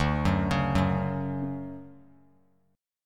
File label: D Major 7th, chord; arpeggiated; JGuitar